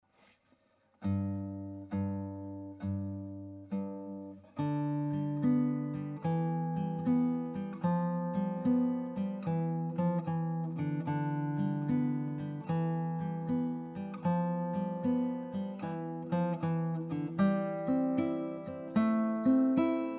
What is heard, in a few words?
blues, gitara; Nagranie trzech gitar